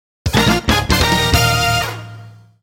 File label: Race Start Fanfare